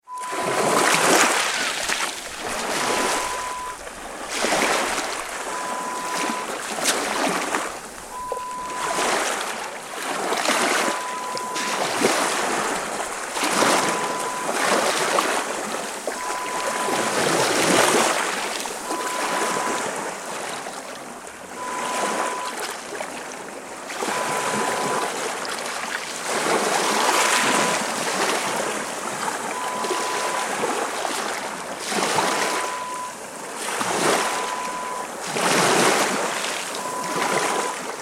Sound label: Ocean Wave sounds